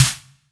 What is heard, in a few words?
Snr, drum